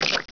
cricket splat; gearbox

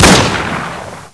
fire both, weapons; svencoop

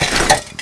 player ladder

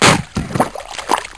bullchicken attack; gearbox

